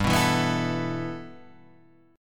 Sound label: G 6th Add 9th, Guitar, Half Step Down